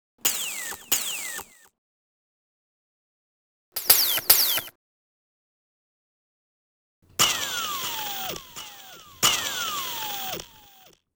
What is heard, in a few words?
Light saber